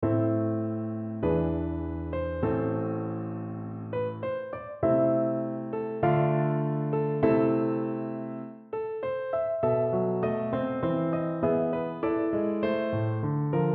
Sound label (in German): Arpeggione Klangbeispiel